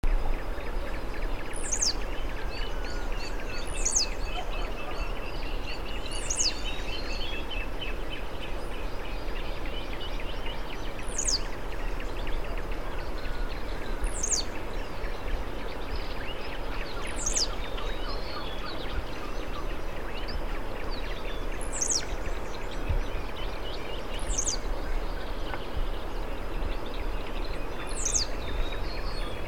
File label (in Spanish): volatinero, Volatinia jacarina, Blue-black Grassquit; cantos